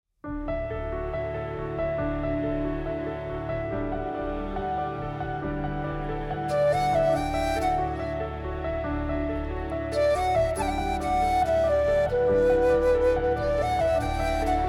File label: Happy Birthday Background Score